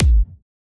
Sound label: d kick